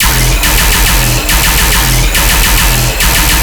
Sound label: Bass Loop E 140BPM, Dubstep